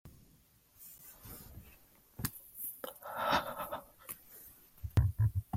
breath

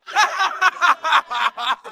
laughing; joker

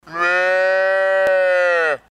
baby calf

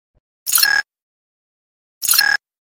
jump pad

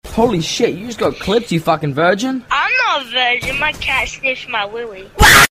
waaaaaa

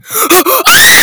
kid screams at the top of his lungs

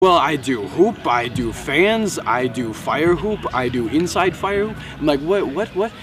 firedrums; wes peden

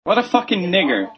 muncher; pewdiepie; pubg

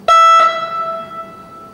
tram horn loud

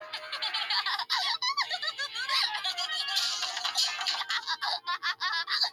cute laugh; fifi la fume

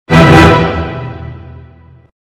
orchestral explosion

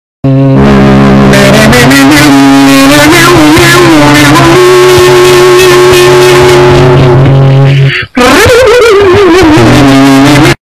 humming

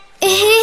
ehe

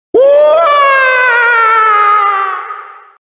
screaming sound effects; mario; mario galaxy fall

